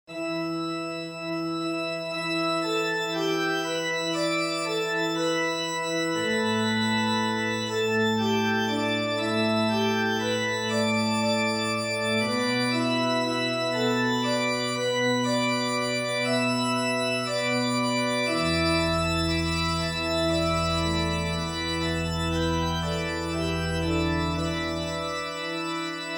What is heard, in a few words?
SATB org midi opening excerpt